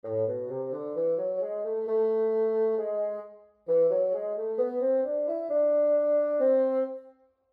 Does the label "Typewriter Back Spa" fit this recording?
No